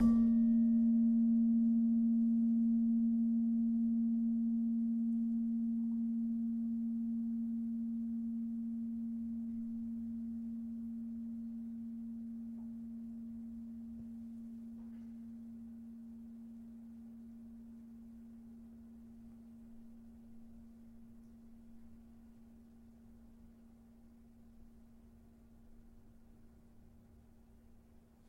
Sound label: Slentem 3 A Sharp, gravity harps tuned